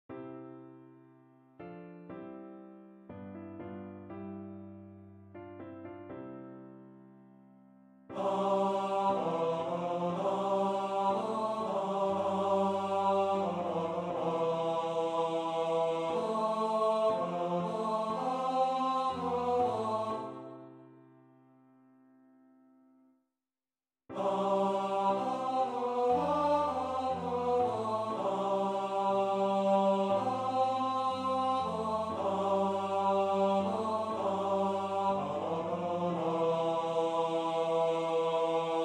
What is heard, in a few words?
Tenor; A prayer for Ukraine